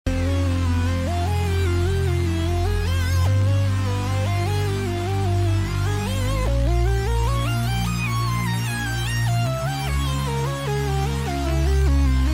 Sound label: mob style 808